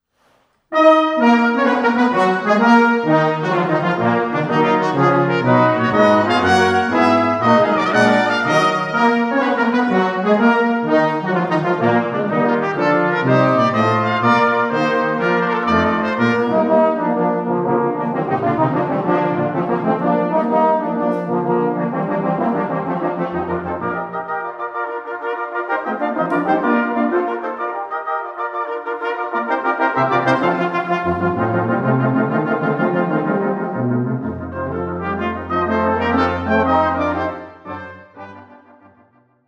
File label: Brass Sextet In E Flat Major, Finale Allegro con spirito